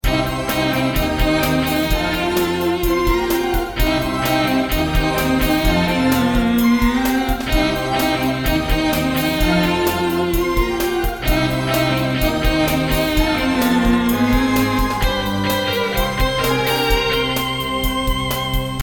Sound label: Halloween Music; HalloweenPack